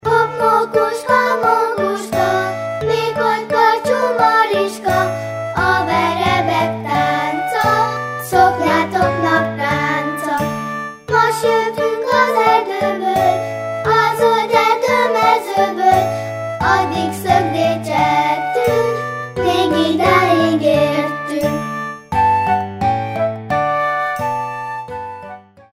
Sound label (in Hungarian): hopp; ovodasok